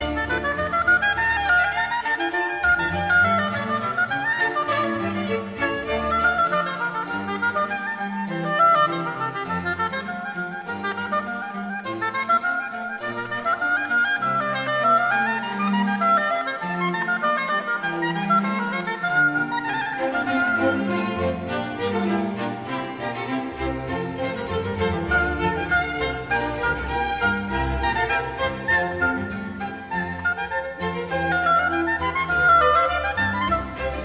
concerto d moll bwv 1059r fuer oboe streicher und basso continuo allegro

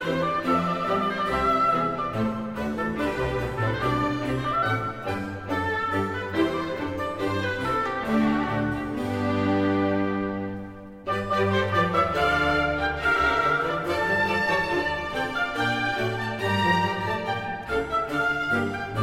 orchester suite no 3 in d dur bwv 1068 bourre

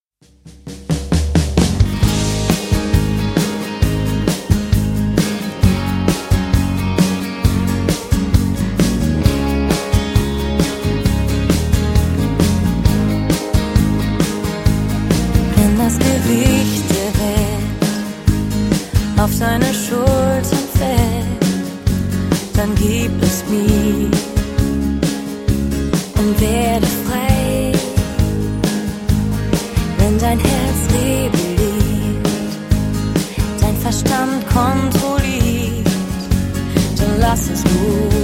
lachen

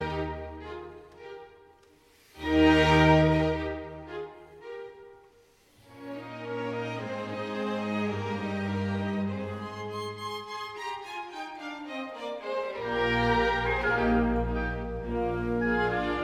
violinkonzert nr 1 b dur kv 207 adagio

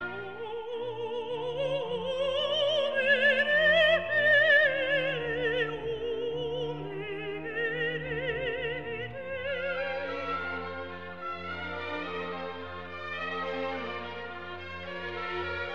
Messe g-moll, mass in G minor; bwv 235

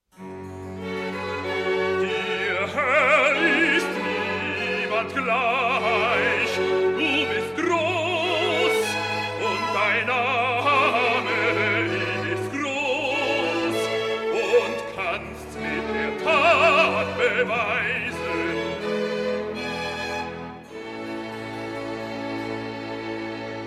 recitativo b; unser mund sei voll lachens; bwv 110; dir herr ist niemand gleich

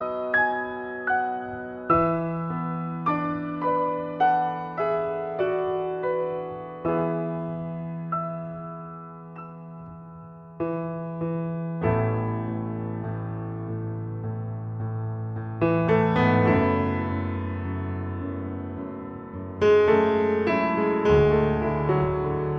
ueberleitende improvisation am fluegel

